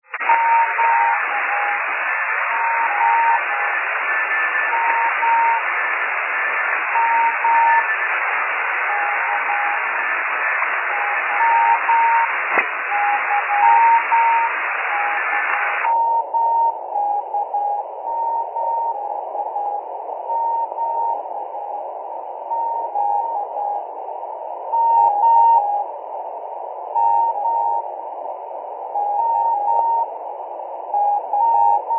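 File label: beacon with filter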